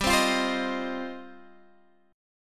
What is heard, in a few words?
F 7th Suspended 2nd G